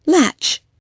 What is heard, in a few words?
latch